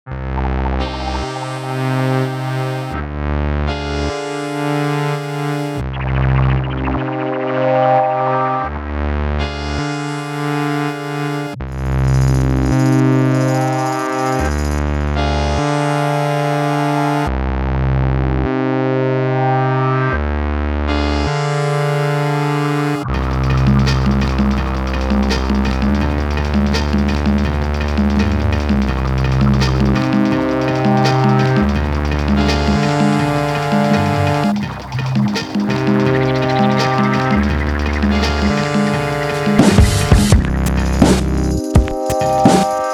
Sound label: Phasing Rain; Wermonster